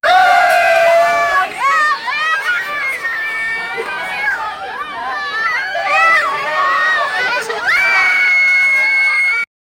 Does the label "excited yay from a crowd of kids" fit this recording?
Yes